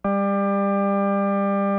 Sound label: CX3 OVDRV, CX-3 organs; Greytsounds